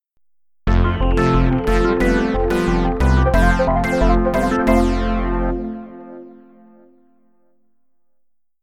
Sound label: jingle; garageband; Szprot